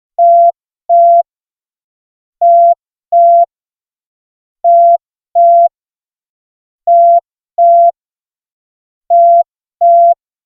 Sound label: communicator beep ringtone